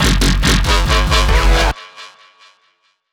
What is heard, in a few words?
dubstep club bass